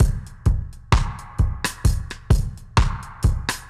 Drums A Wet 130, dub drums; musicradar

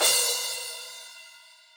crash, Millenium MPS-400

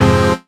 Hit, Akai MPC5000

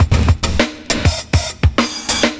70s Breakbeats; USB Soundscan vol.46; AKAI

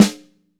MAPLE SNARE

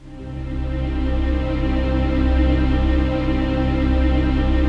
TRANCPAD; Best Service; XX Large Pads; Sample CDs

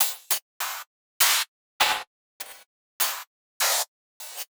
Side chain gated Topline (100BPM); musicradar

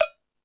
Jungel Percussion, E-MU Pro-Cussion

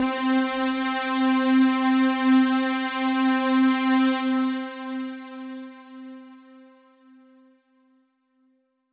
Pad C, Synth Prophet One Shots; Electronisounds

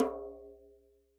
tom rim pp, hand drums; SoundBytes - Early Patches

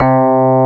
ELEC RHOD C2; AKAI S-Series CD-ROM Sound Library VOL-1

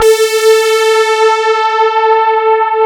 CMI PIANO

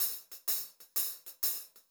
126 CL HH R, Pop-Rock Drumloops; USB Soundscan vol.37; AKAI; 90 s Sample CDs

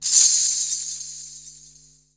ghunghuru, Yamaha Motif ES-Series; 300 Drum Machines

